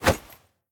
punch; smite; gilgamesh

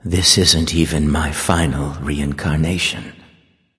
death, voicepack; smite; vamana

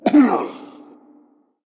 hitreact human male large

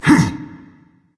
grunt lift berserker; cuchulainn

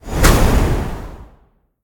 lobby wind hit; smite; tiamat

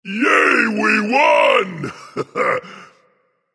nv yay; Smite; ymir skin14

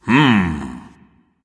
thinking, voice; Smite; ymir skin12

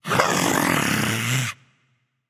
growl; smite; xingtian

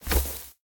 Footstep; Smite; god Kumbhakarna

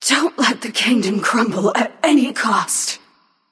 death; smite; nox

